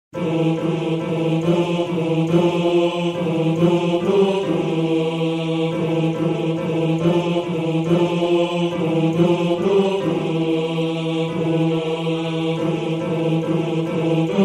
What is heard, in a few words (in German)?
Bass; Lobet den Herren; Bass 1